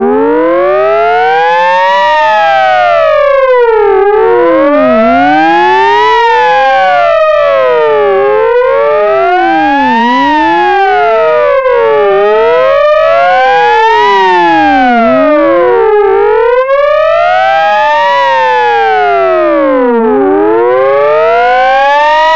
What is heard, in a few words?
fire truck piercer siren